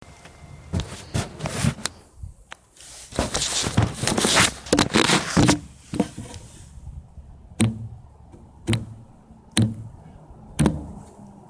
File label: strumming my hammock ropes